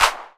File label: CLAPS HIP; Best Service Dance Mega Drums